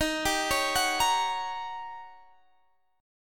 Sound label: D sharp 7th Sharp 9th, Guitar, Standard; arpeggiated; D#7#9; JGuitar